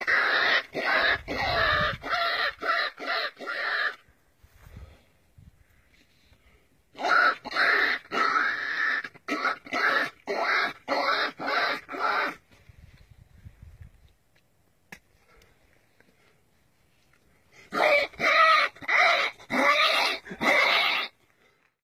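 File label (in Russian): zvuki pekari, peccary sounds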